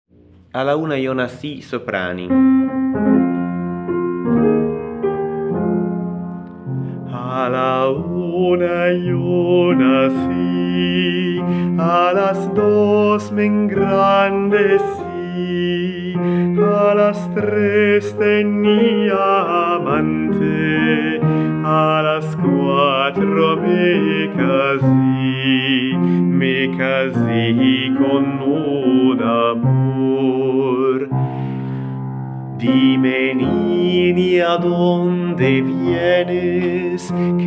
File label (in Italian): SOPRANI